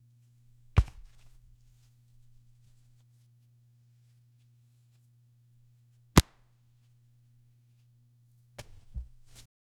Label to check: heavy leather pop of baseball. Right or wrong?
Right